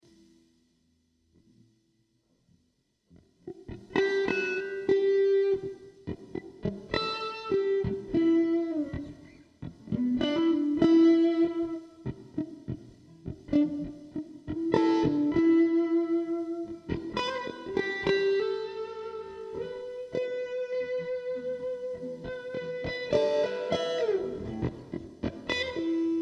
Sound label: Em 100 GTR Jam